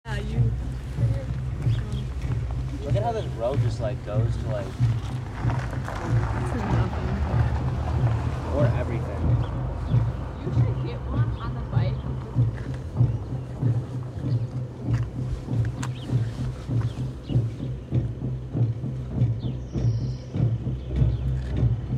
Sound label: Drumming